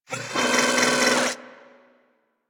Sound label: Foundry Motor Backward; Warframe; Liset; Hub; Machines; Tenno Foundry